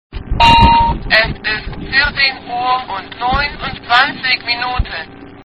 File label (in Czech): budik nemecky